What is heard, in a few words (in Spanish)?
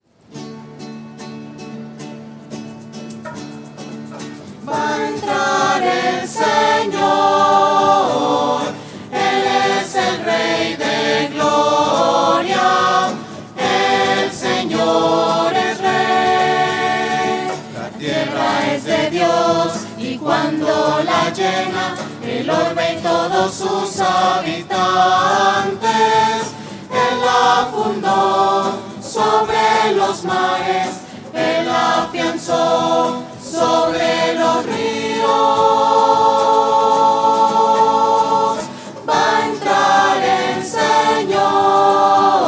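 va a entrar el señor voces; misas 2012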